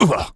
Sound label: augh; Postal Dude Voice Lines